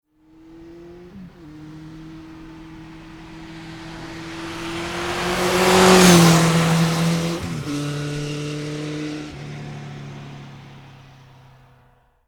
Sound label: Motorbike Honda 1100 Pass very fast; Motorcycle; Tom Hutchings